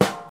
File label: fat acoustic snare sample g key, acoustic snare sample tuned to the G note; snare drum samples